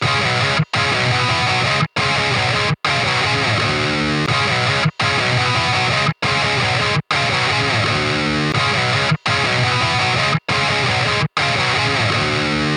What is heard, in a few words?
Tube Screamer Engl Fireball